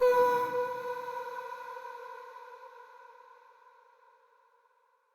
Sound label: Statue Voice B, Player Instrument; Statues